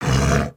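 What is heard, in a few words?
Sabertooth Approval; creatures; pets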